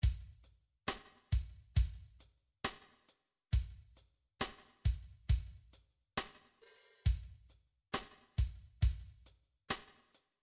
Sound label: drum midi 8beat rok; Drum Midi Files Collection